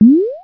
Elecdrip; Bart Bash

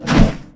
Big Swing; Knight Online

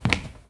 Wood footstep

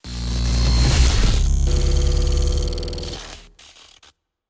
Elec Burst; Aerobot

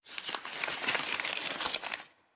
Spell Golthor Flip

